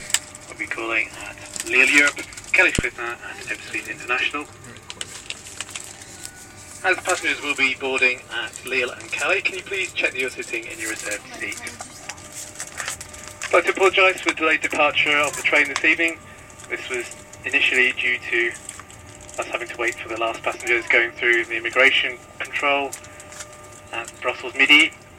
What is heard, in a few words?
kids on eurostar tracks